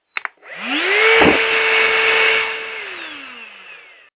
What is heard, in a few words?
dyson dc16